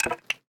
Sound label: symbol Stop On Stone; magic maid cafe